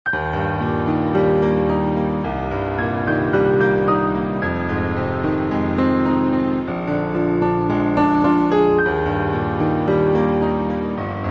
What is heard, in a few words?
Piano Version